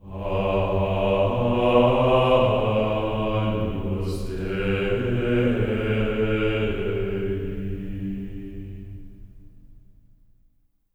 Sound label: AGNUS G3, Symphony of Voices; Spectrasonics; GR AGNUS